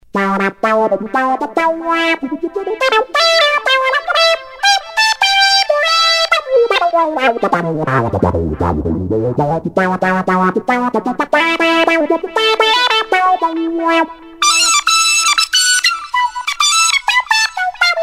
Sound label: Cry My EWI